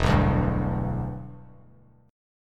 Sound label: E Minor 7th Bass Standard; strummed